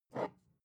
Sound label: glass bottle pick up soft